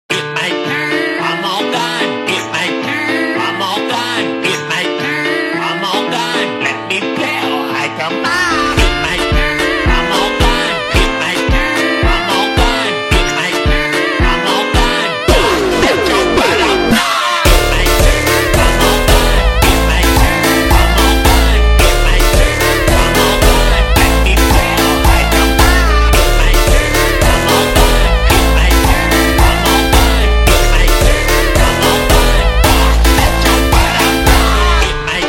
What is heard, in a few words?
Jumpstyle super Slowed